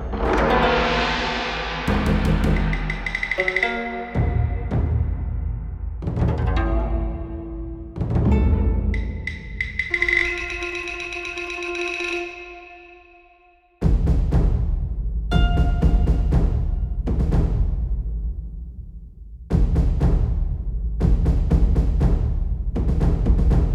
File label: tension alt; Oriental Empires